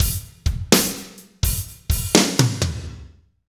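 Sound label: slow shuffle, Roland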